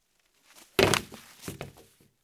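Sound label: sounds of metal cable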